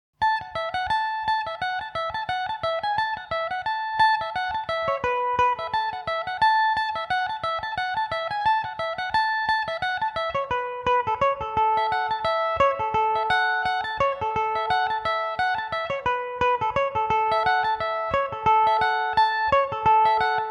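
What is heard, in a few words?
some pup A major, irish mandolin; Belmuse EM-190 electric mandolin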